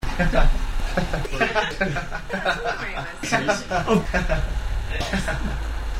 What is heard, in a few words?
laughs